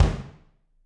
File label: BD, Drums; Bob Clearmountain Drums I